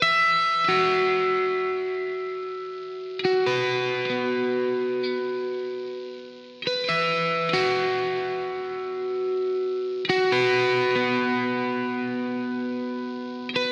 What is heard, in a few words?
guitars only